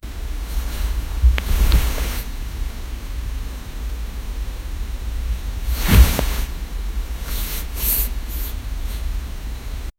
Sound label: soft romantic bedroom amb